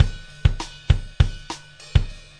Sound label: 100 funk ass ride, drum loops and breaks; 091 to 100 bpm